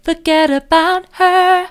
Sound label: forget about her soft, vocal